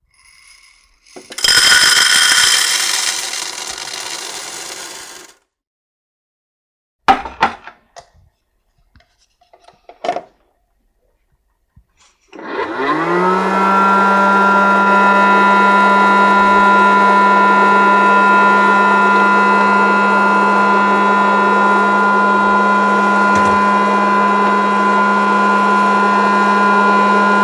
food popcorn kernels into hot air popcorn popper then operate; sound effects one